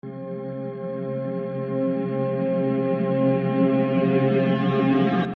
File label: reversed riff